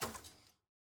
ui generic section microfiche click

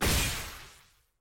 aah; lux

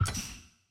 dragon ui button continue click

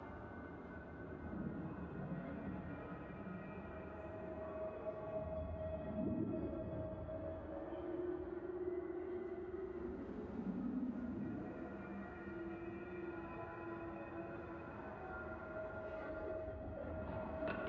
amb

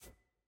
room select room hover

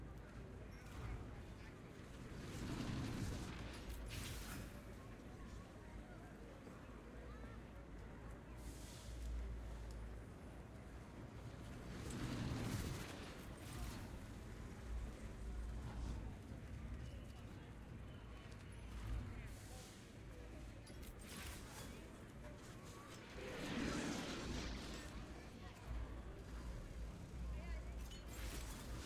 amb streets; piltover zaun piltover

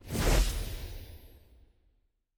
reveal acknowledge; loot

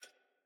sfx ui generic hover

